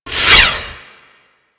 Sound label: Space Pod Rocket By; Grineer; Vehicles; Warframe